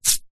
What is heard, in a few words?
knife pull out from grass mud deep